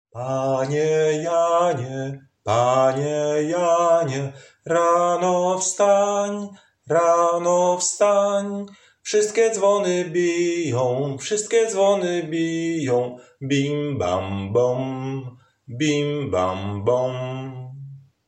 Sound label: singing voices tenor